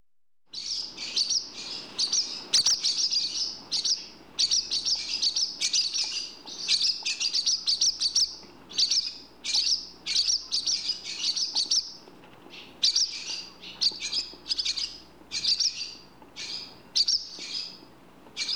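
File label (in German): Rauchschwalbe, Vögel in Europa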